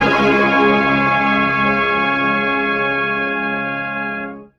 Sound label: squelch, Horns; Notes